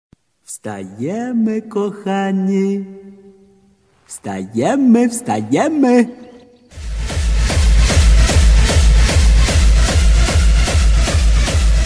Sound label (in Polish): budzik; reggae